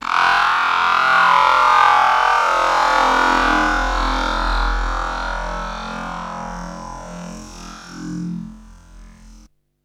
FX, Drum & Bass

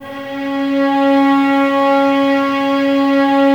STR VAS C#, String Ensembles, Violas, No Vib; Miroslav Vitous

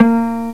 ACC BASS, FAIRLIGHT CMI IIX, STRINGS

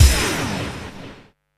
FX, retro house

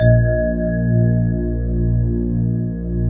SYN, Roland, digital

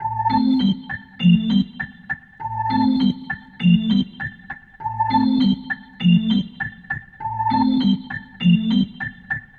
Rotor Riff, Organ

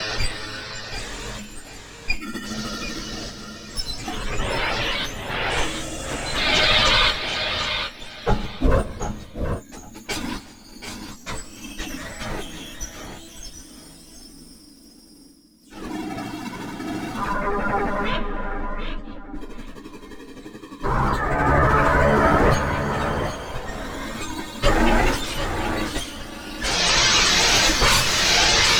Modular Noizzzz; SFX & One-Shots

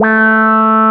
QUAC, QUACK SOLO, Synth

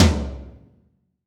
TOM, AMBIENCE TOM

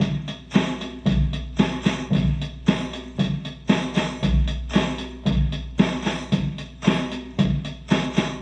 Loop, rhythmate, classic machines

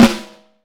FLAM, Vintage Snare Drum; 7X14; Q Up Arts; Johnny.C's Vintage Snare Drum

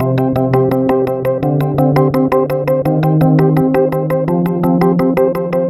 SEQ, Jungle; E-Lab; XTC Files of Jungle

